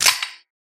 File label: gun loading; soundscrate